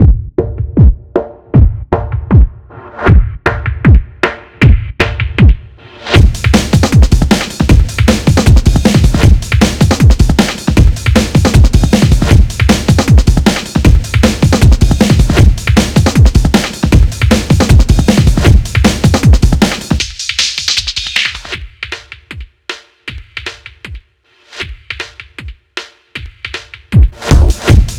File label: MUS LASTBOSS LOOP Track Percussion; rbx lastboss; HappyDayInROBLOXia